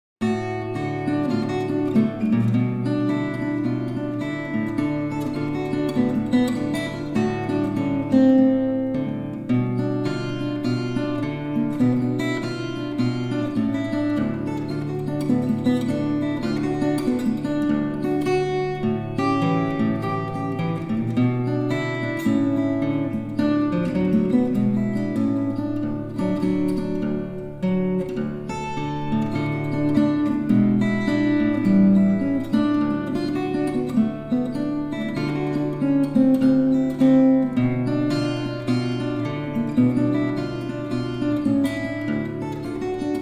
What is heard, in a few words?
bad feelin blues